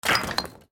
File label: city destroy